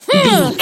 voice female attack

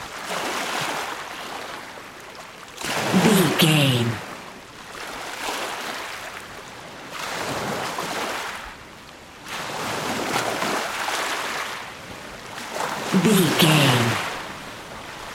beach ocean waves water loop

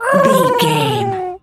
pigeon call angry

